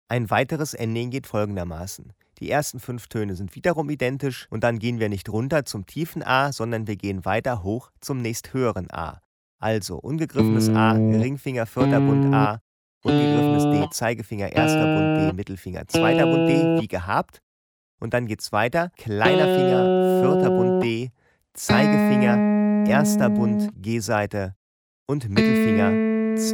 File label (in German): Ending, Bluesgitarre; Ending 4